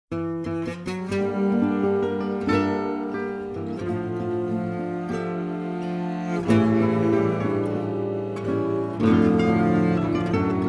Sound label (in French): Les Deux Guitares